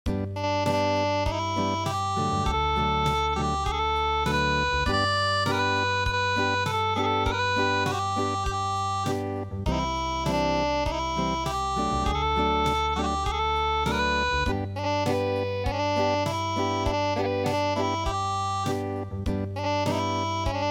Unplugged, midi

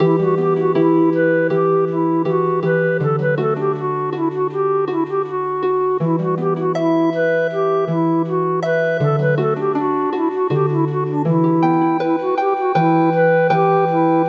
an dro; St Patricks

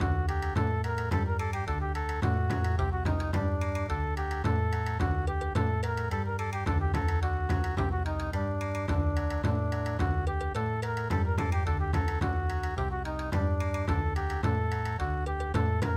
Bon Odori

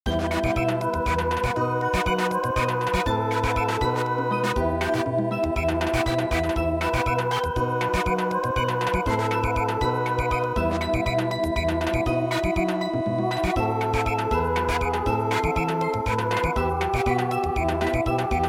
Samba

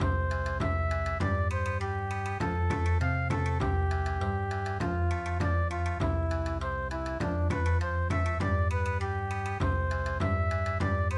Bon Odori

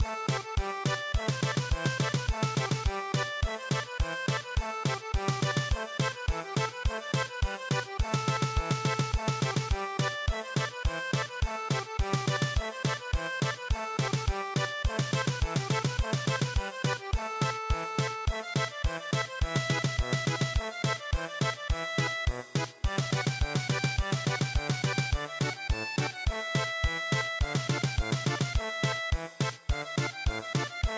German Polka

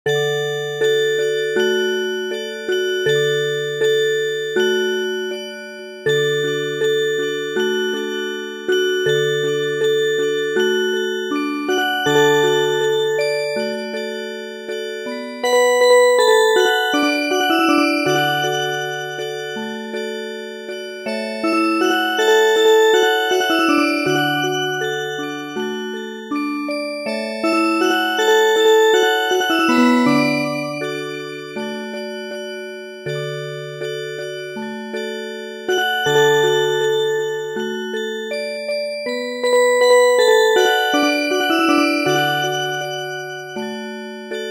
Music Box, midi; A Sing Sing So

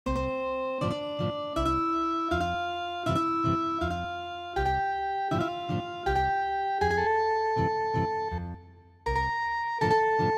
Ethnic Guitar

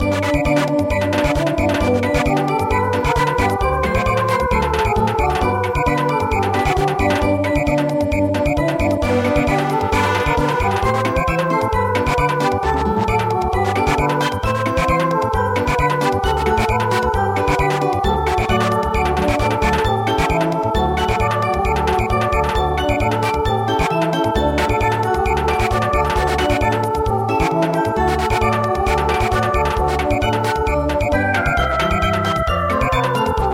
Samba

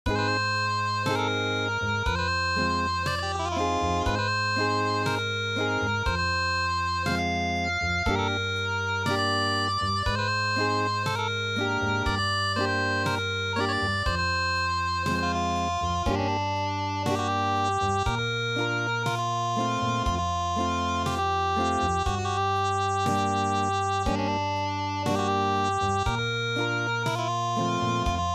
Acoustic, midi traditional

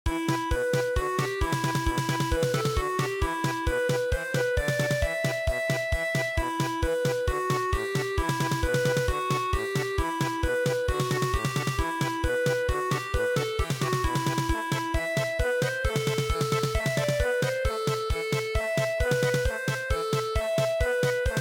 German Polka

